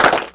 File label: Clapbox Pitch, Clap Box; Ableton Foundation FreePacks